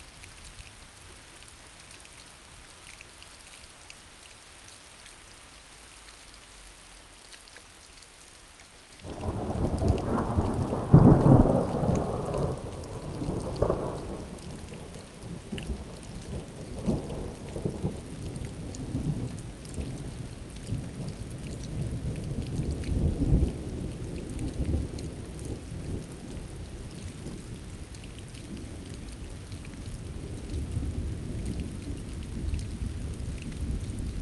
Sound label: rain and distant thunder loop; Sonniss GDC